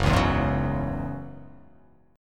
F sharp 7th Suspended 2nd

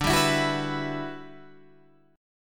E Minor 9th D, down, Guitar, Standard

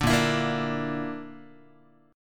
A sharp Major Flat 5th down Guitar; strummed

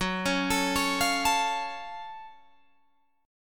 F sharp Minor Major 7th F sharp Guitar Open G

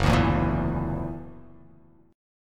F sharp Augmented 7th F sharp, chord

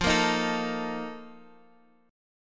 A sharp Major 7th Suspended 4th

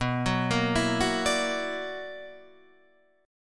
B-Minor Major 11th

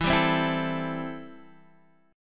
A-Minor 7th E

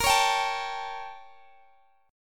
A 7th A sharp, chord; A7/A#; strummed

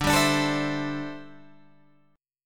D 7th Suspended 2nd Suspended 4th, Guitar Open G

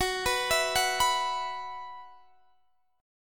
E Suspended 2nd F sharp

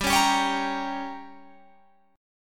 G Diminished G, Guitar Open G; 17,18,17,18,20,20; down

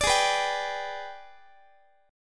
G sharp Minor Major 7th Flat 5th G sharp, Guitar; G#mM7b5 strummed; x,x,17,18,15,18

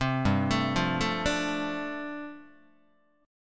G 7th Suspended 2nd Suspended 4th G chord, Guitar; G7sus2sus4 arpeggiated

